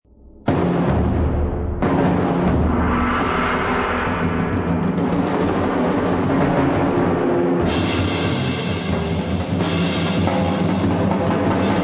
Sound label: Symphony No 1 percussion version